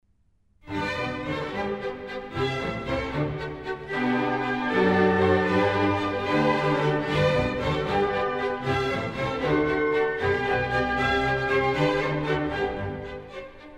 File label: Violin Concerto in G major; Mozart Violin Concertos 3 & 5